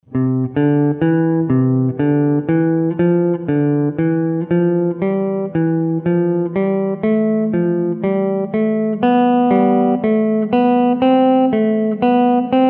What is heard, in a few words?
1-2-3-1 Pattern, guitar; introductory guitar skills worksheets